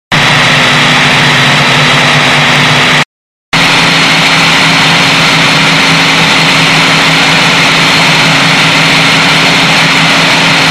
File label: Morning Noise Broadcast